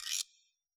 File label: guiro long, Yamaha DJX Rock Kit; 144 Rock Kit